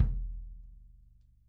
KICK

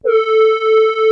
SQUASAR A3, LEAD; Music Store - Claudius Diemeter Schwerlos

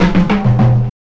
Tom Fill, Trip-Hop; Sonic Foundry (Sony Creative Software); Rondo Brothers Trip-Hop Loops & Samples; Liberation

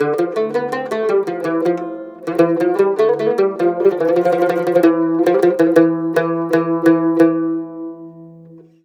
CUMB, CUMBUS, Mediteranean Traditions

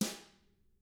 Drums; ILIO; Double Platinum Drums 1

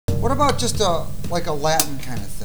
LIKE A LATIN

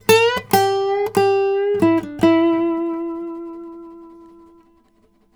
96 BARI LICK, Blues Guitar; ILIO; Fretworks

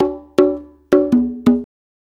Blues Perc Loop; Sonic Foundry (Sony Creative Software); Loops & Samples Drum Tools

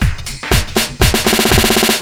shufflin beat (fill); Sonic Foundry (Sony Creative Software); Ugly Remnants VOL-2

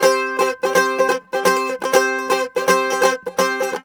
Mediteranean Traditions; USB Soundscan vol.53; AKAI

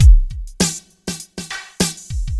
drum loop 100; Vinyl; Masterbits; Vinyl Attack

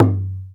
Dohlak Lo; Multisamples; Digital Sound Factory Planet Earth

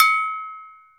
Toke Bell Open; Multisamples; Digital Sound Factory Planet Earth

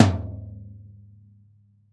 TOM, Drums Acoustic; AKAI; 90s sample CD; USB Soundscan vol.10; RAW KIT 7

